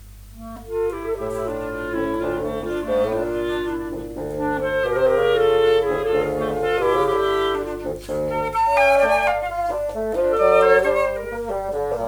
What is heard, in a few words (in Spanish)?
Danza Cubana; Cervantes - 5 Danzas Cubanas (Fl, Cl, 2Bsn): Invitation